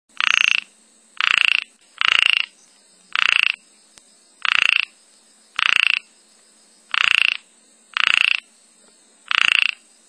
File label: Microhyla ornata